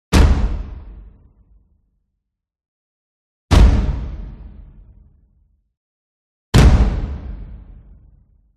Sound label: CARTOON PERCUSSIVE Percussion; smartsound